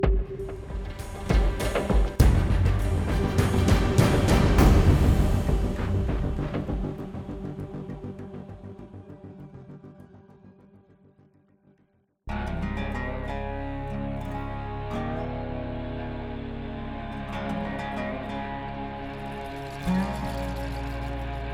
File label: Rock Slide Finale Music; Disney Infinity